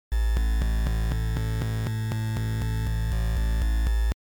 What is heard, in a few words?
vshift, LSDj Wave Synth